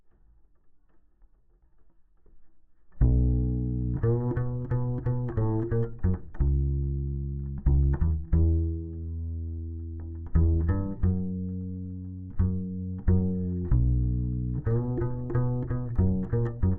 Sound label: Bass DI